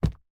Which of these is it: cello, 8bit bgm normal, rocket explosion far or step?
step